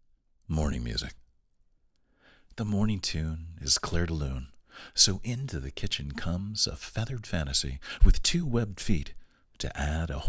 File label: Morning Music